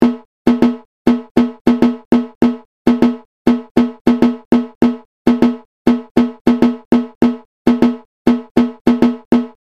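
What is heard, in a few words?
repinique; Karla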